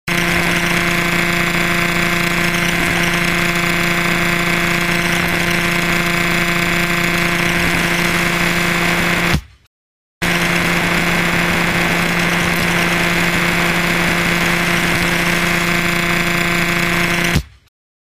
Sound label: Tape Piece